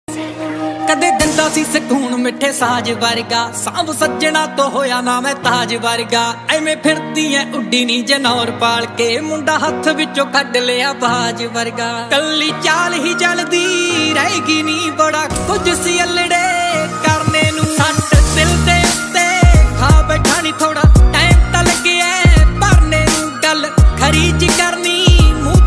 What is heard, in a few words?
new punjabi ringtone